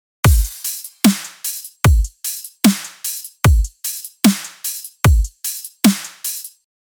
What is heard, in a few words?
Dubstep Loop